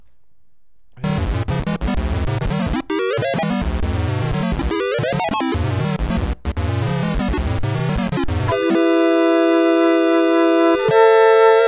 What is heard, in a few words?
flute chords